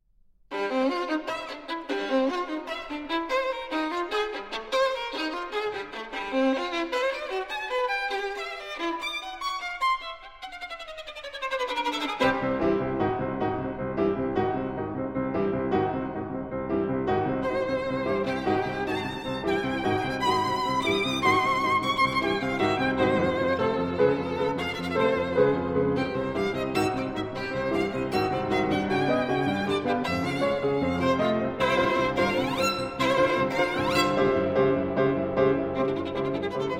Tango Song and Dance III. Dance. Jazz Feeling; Previn

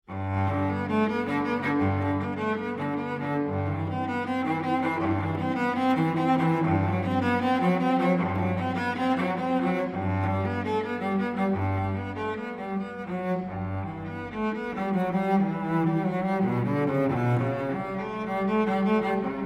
Cello Suite No. 1 in G Major, Prelude; BWV 1007

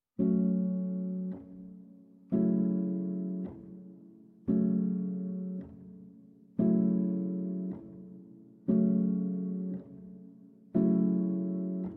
Performed on Piano

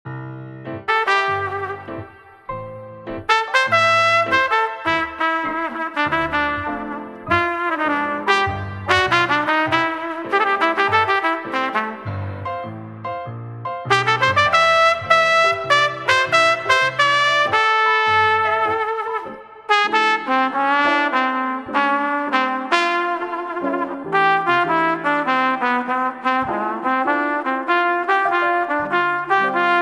French Cafe Jazz Lounge

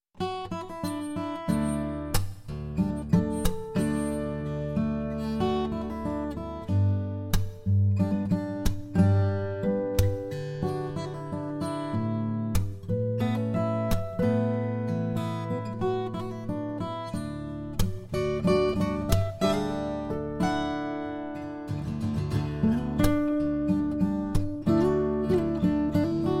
Relax Surf Music

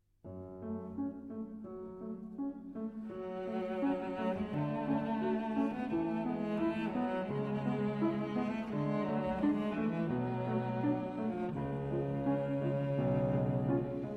for Piano Trio; Pavane, Op. 50; H. Busser

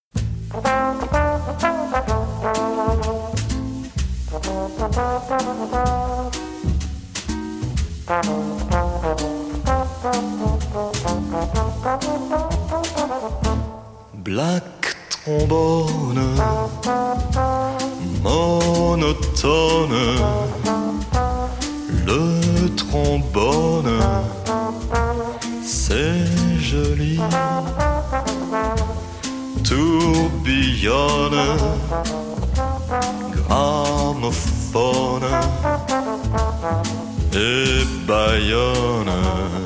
Trombone

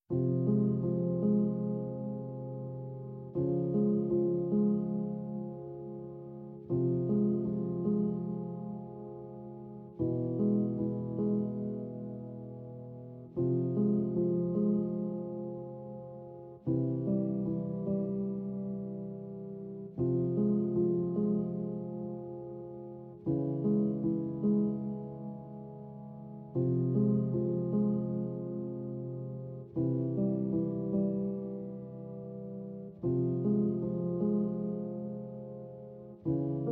Études For Solo Piano; Book 1 V. Étude No. 5

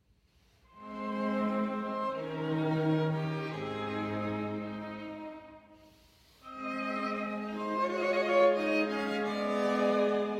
Harp Quintet in C Minor, Adagio

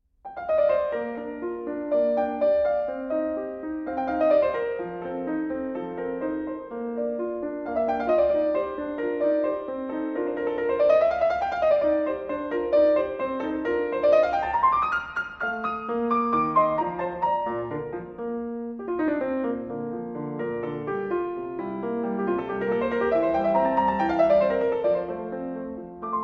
Piano Sonatas in B Major Allegro

